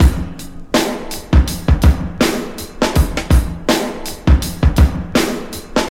81 bpm drum beat C# key, Free drum beat - kick tuned to the C# note